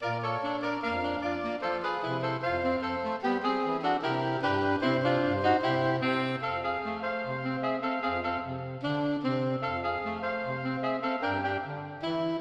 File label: saxophone